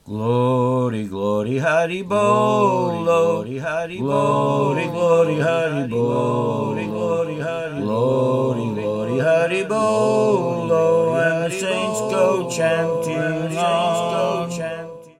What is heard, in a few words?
Glory Chanting; Hurray Krishna Hurray Krishna Krishna Krishna Hurray Hurray